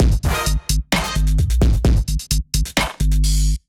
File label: Kit Melodic 130 bpm, Drums; MIDI Clips; Buttermilk; Ableton; Chop and Swing